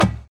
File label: natural snare drum D key, steel snare drum sound tuned to the D note; snare drum samples